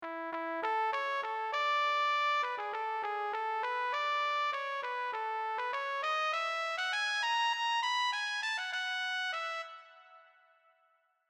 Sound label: Melodic Intervals; y12 sem2 exams